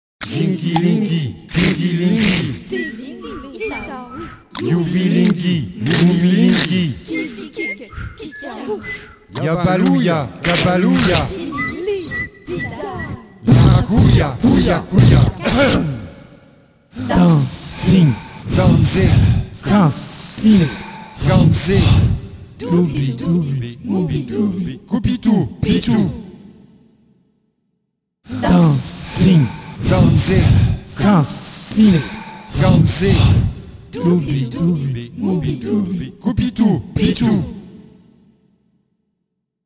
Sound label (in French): swing